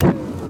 hydswdown; Bombardier

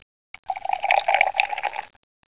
Pour; Princess and the Pea; SoftKey

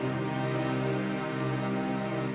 2 string C; Hitman; Music; China

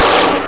lase

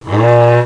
moo

